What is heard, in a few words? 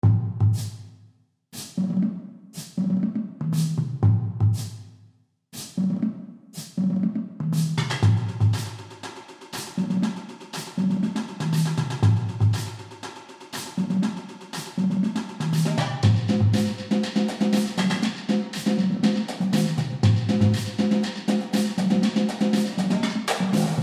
Drummy